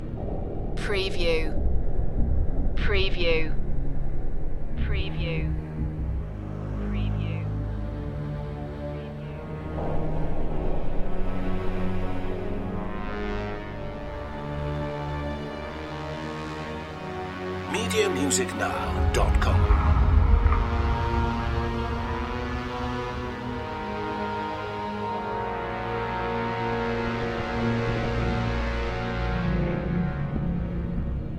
distorted fragments loop

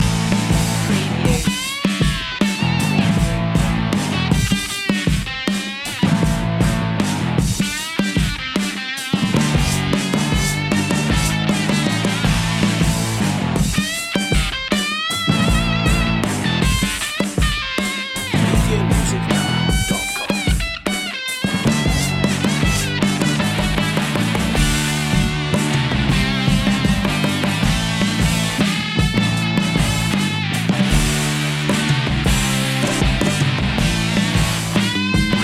southern fuzz loop

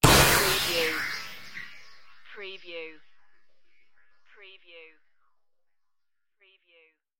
futuristic weapon strike

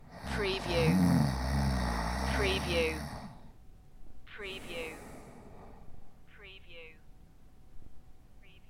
Snoring, Male Snore

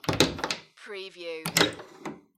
Wooden Door Unlock

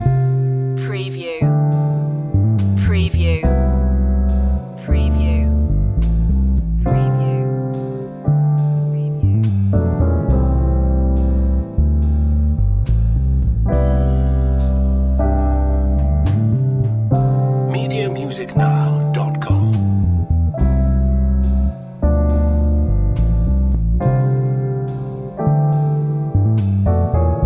jazzy love loop

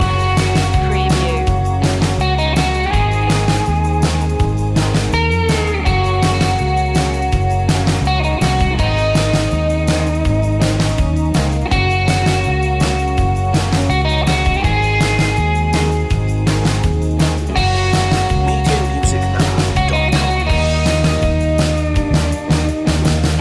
breakin waves loop

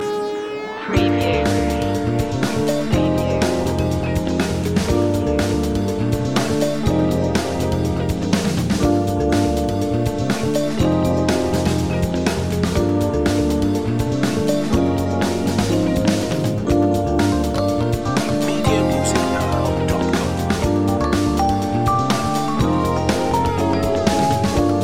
upgrade garage loop

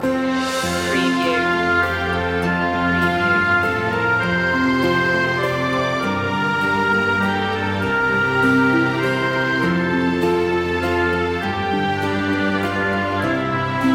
orchestral loop; away in a manger; media music now

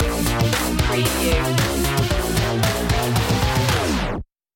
funhouse 84 stinger

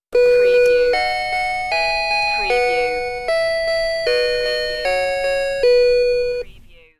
Toy Twinkle Star

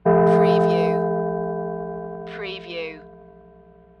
Gong Two Tone

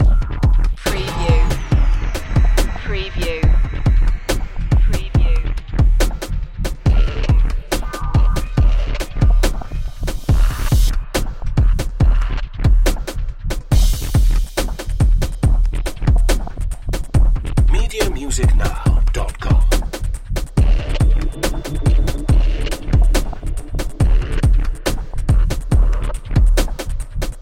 under the surface loop

